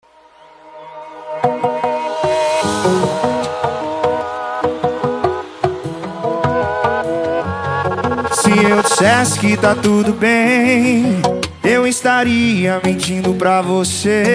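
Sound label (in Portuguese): especial sertanejo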